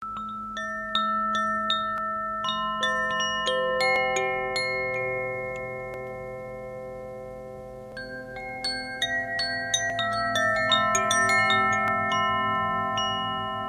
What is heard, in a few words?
Koshi chime Terra